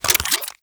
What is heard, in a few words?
Briefcase Akimbo Pistol Equip; Warframe; Corpus